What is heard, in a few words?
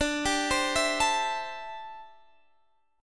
D 7th Suspended 2nd Suspended 4th, Guitar, Standard; 22,22,22,21,22,x; arpeggiated; JGuitar